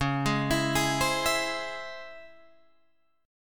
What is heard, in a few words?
Db Minor Major 7th Db Guitar Open G